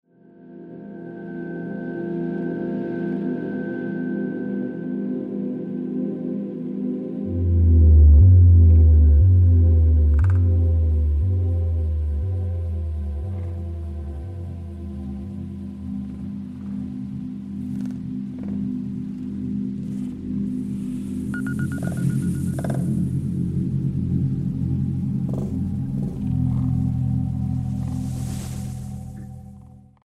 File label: Masa Drone; Experimental